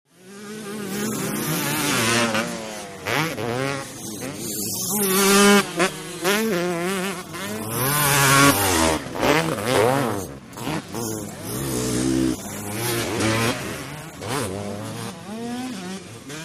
Motorcycle Motocross; By 250 Cc ( Several ), Cu Fast Bys L / R, Shot Cu.